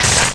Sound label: ollie wire spark, terrains; Tony Hawk's Project 8; XBOX